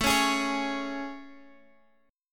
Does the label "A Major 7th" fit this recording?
Yes